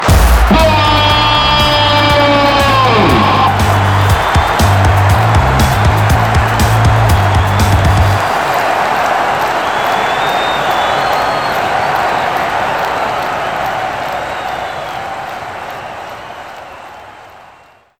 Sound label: Win; genesis; euro Golden Cup Desktop